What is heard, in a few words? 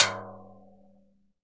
CASCARA, Synclavier Percussion; ILIO; Synclavier Percussion 2; World & Orchestral